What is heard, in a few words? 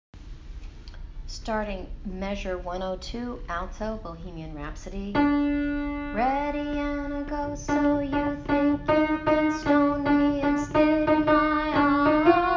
Rhapsody Alto